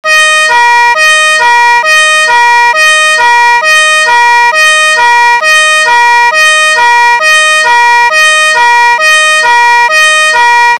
FA DO ( 261-349Hz BOMBEIROS), sirene

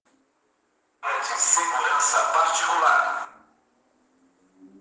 mensagem alerta, sirene; 27C10 NOBREAK

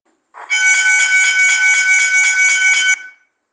cruzamento ferroviario, sirene; 27C10 NOBREAK

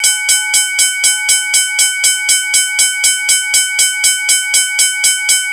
CRUZAMENTO FERROVIARIO; REBT 27C20

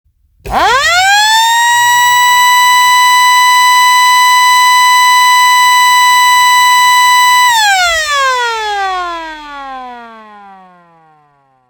sirene eletromecanica; diponto dp3000

